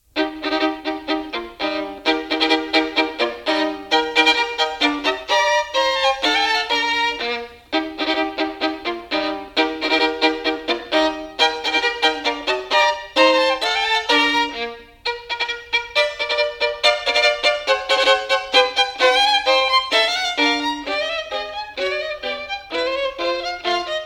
Caprice For Solo Violin; Ruggiero Ricci; Paganini Caprices

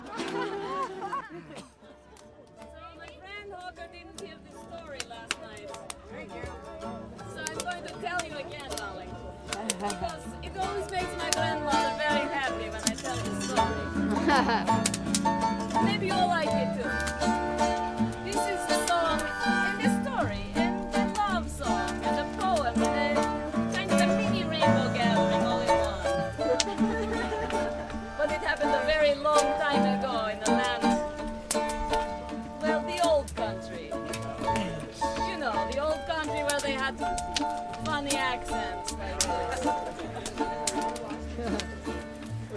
balalaika; 2006 CO rainbow gathering

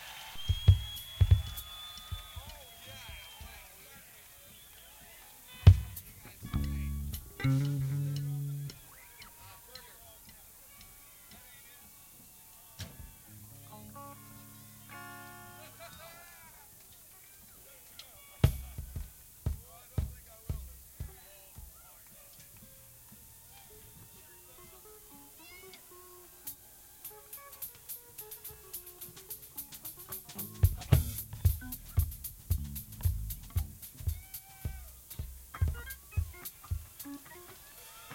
Tuning

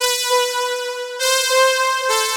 Simple synth riff; Vortex Sound Waves v2 Loop Pack

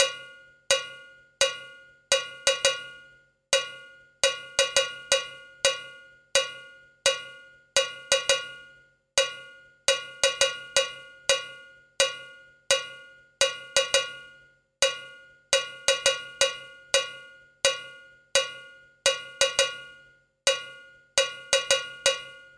Cowbell

